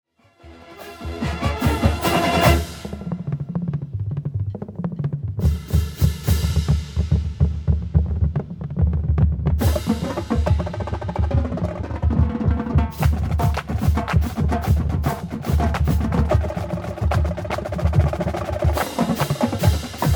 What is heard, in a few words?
Drum Feature, marching band